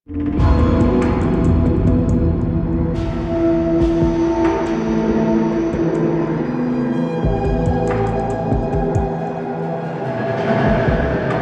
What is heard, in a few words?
Hub Intro Cue, Music; Warframe; DE; Hubs; Zariman